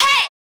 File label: vox